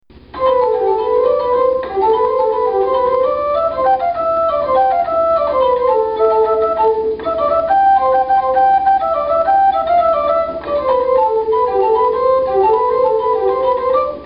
así suena un órgano portativo

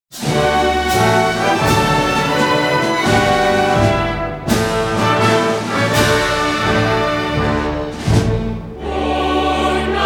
CORO Y ORQUESTA EN CASTELLANO; HIMNO DE NAVARRA

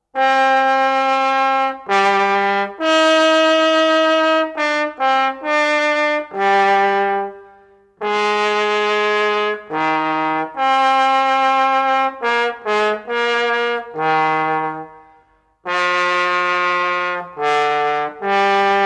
TROMBON

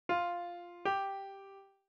Intervalo 2ª

